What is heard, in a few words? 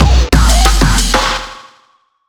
MUSIC JINGLE LEVEL COMPLETE